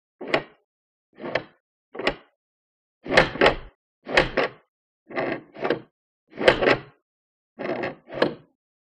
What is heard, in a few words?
Door Latch Person Lat